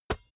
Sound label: basketball single hand catch soft